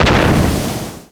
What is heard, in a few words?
ship miss small effect; Hearts of Iron IV Death or Dishonor